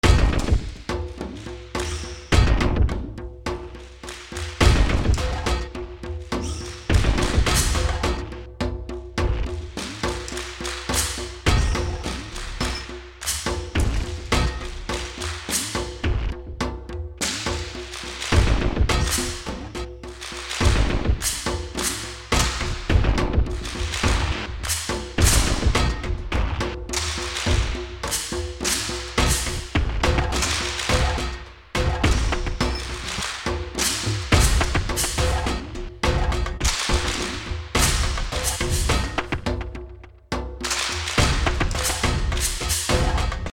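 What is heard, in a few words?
Battle Loop Rural Percussion; Fallout New Vegas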